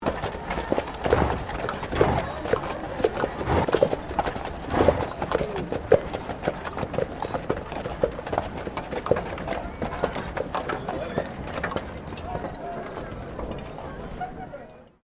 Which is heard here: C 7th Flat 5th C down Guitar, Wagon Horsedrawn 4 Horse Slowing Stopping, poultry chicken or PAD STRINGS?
Wagon Horsedrawn 4 Horse Slowing Stopping